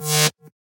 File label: electricity hum short; SoundsCrate